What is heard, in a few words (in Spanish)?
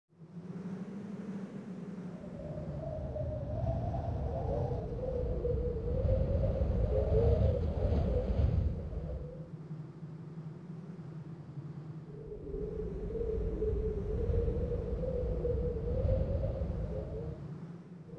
viento; hallow